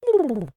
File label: turkey short